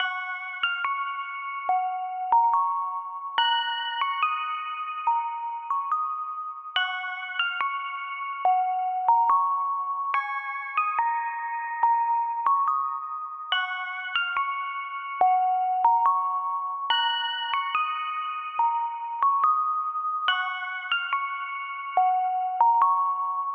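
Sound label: winter bell, music box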